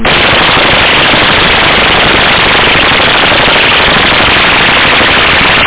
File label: CUT